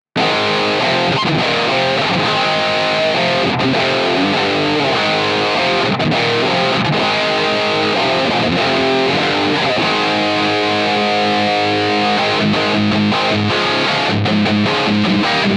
Sterling Majesty X Bridge Humbucker Highgain Rhythm